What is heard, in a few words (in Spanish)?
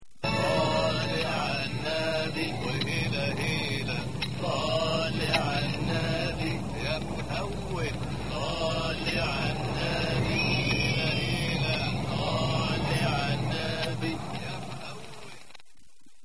cantinela